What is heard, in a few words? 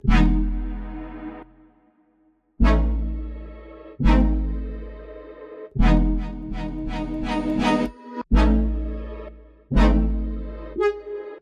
lofi